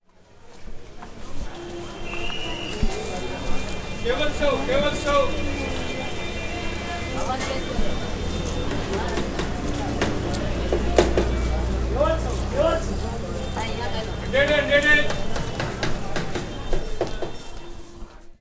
BOMBAY, AMBIENCE, Indian Traditions; USB Soundscan vol.45; AKAI

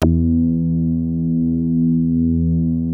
Prophet 5, E3; Hollywood Edge - Giorgio Moroder Rare Synthesizer Collection

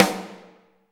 SD Real, Roland; Sound & Vision - Gigapack I

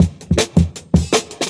160, Jungle Breaks; Original Jungle Breaks

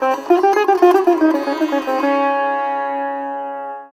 SITAR; Spectrasonics; Heart of Asia

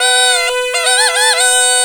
PUNGI; USB Soundscan vol.45 - Indian Traditions; AKAI

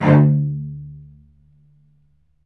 SPICCATO, Spiccato Celli; StSpiccato Celli

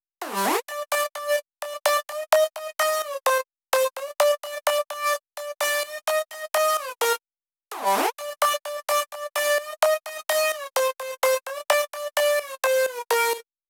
128BPM Synth Lead Add2 Dry SC, Root G; Vengeance - Essential Clubsounds Vol.5; VEC5 Demo Constr Kits; Early Morning Kit

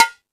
Latin Drums, E-MU Pro-Cussion

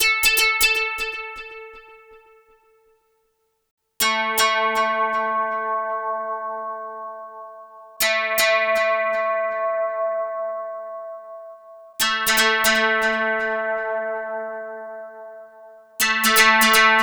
Metallic Hit, Chillout; Sonic Foundry (Sony Creative Software); Afterhours EDM; Chillout Service

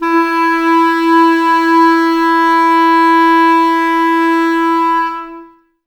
wind clarinet, Clarinets, Wood Winds; Miroslav Vitous - Woodwind Brass Ensemble; 90's Sample CDs

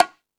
Jungel Percussion, E-MU Pro-Cussion; drum machine

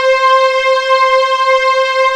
PAD C4, SYN PAD; MKS

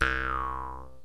JEW'S HARP

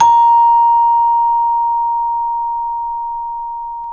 CEL A#3; Roland - LA Composer vol. 1; STANDARD PRC

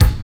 KIK, Dance Kicks; Roland L-CD701; Dance K1

